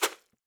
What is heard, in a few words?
dash